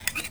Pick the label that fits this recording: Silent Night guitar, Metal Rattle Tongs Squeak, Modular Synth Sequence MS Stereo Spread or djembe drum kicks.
Metal Rattle Tongs Squeak